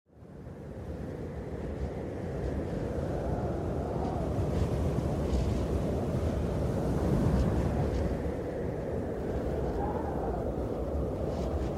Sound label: Snowstorm icy wind blowing